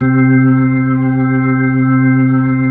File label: B-3